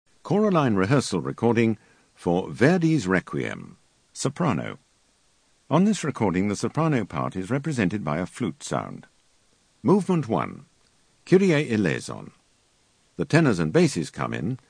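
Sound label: Soprano; Verdi Requiem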